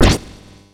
Moderate Sword Hit; Super Smash Bros

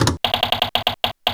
Door

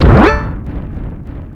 Koopaling Pillars; New Super Mario Bros. Wii

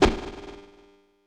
Strong Whiff; Super Smash Bros

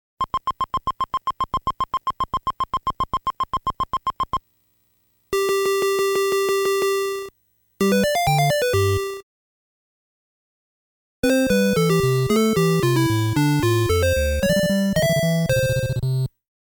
bonus stage perfect game over

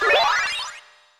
Shield Block; Ness; Super Smash Bros

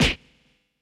Moderate Hit; Super Smash Bros

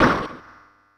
Small Hit; Super Smash Bros

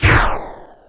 Bowser Jr Fire Ball; New Super Mario Bros. Wii

